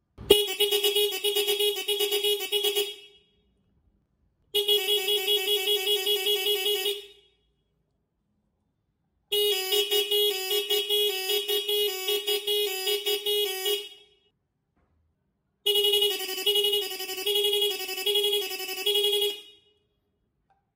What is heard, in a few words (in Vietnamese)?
tieng coi nhai xe may; bip lien tuc